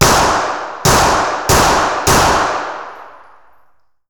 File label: gunshots 4 indoors